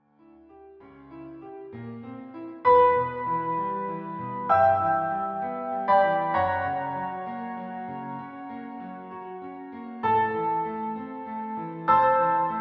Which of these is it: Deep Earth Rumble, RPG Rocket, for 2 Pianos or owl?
for 2 Pianos